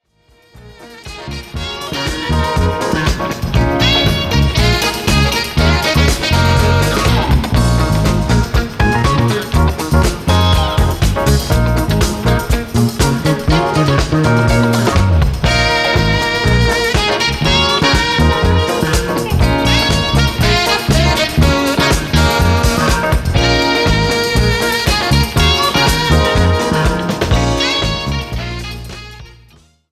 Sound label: fusion radio